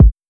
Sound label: Kick